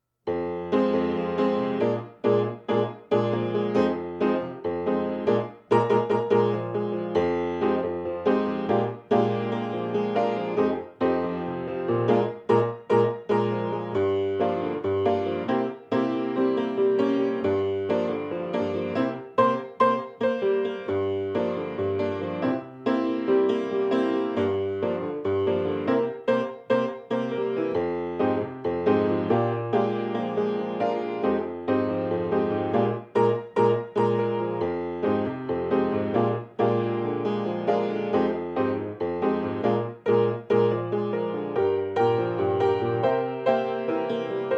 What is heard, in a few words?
Latin Jazz, Piano